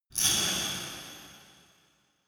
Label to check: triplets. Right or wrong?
Wrong